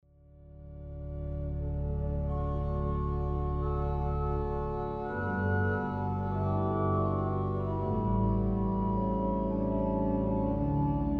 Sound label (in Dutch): Avondlied; God Zij Met Ons Deze Dag En Nacht; orgel; pieter heykoop improviseert haarlem